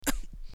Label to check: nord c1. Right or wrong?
Wrong